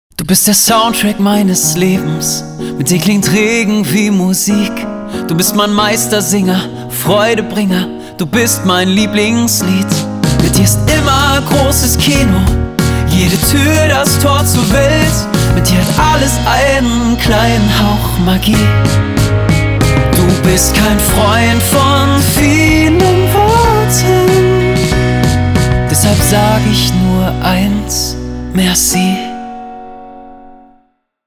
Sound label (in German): Langsam Soft Piano Mix